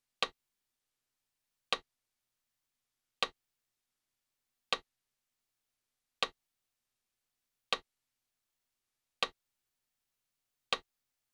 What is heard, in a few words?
55BPM